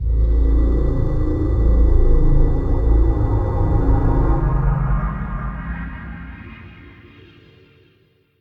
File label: Transition Whoosh Flutter; bottlerocket